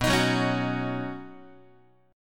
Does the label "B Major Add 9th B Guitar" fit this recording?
Yes